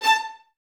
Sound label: Violins A 5, String Ensembles; Miroslav Vitous; LINKS ORCH